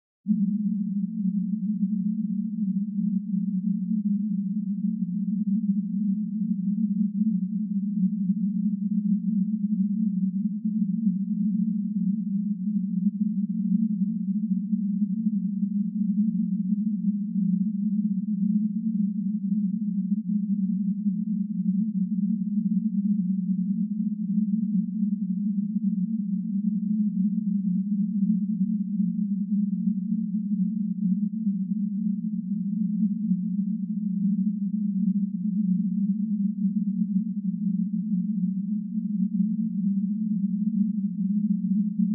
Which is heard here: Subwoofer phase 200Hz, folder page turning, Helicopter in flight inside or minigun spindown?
Subwoofer phase 200Hz